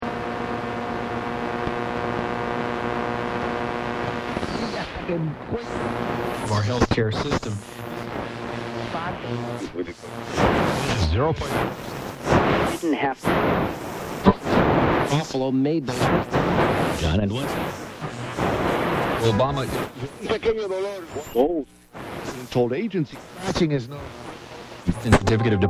Sony Brick Radio AM, Tuning radio, searching channels, various broadcas; Sony Brick Radio AM01: Tuning radio, searching channels, various broadcasts in and out.; Noise Sound Effects